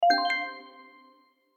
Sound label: notification high